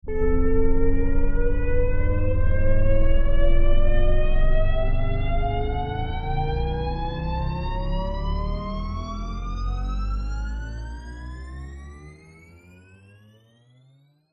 science fiction spacecraft engine rev up speed up; zapsplat

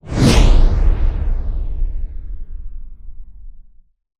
Swell To Impact Flanged; bottlerocket

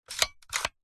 leisure toy gun plastic slide pump action

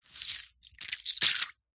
nature ice slushy melted pick up drop splash

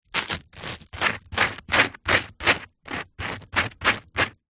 toast butter spread knife

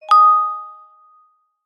multimedia notification bell chime ping tone

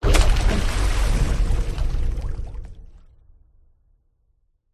water whoosh impacts movements; george gazetis

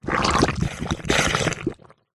cartoon slime gooey mess movements; zapsplat

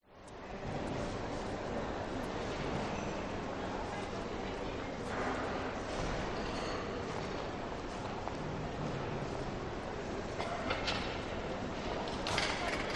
Train Station; Audio Hero

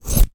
scissors cutting fluff or hair; foley; zapsplat

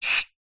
industrial gas release hiss short from gas bottle

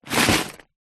leisure box movement table; lego

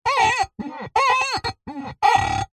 foley glass jug thick finger rub squeaks

